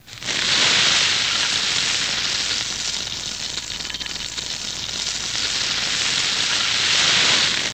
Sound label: Sizzle Constant Loop, cooking